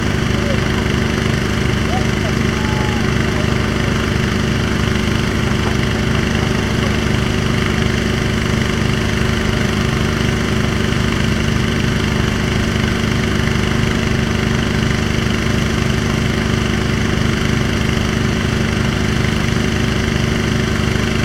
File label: Power Generator Construction Place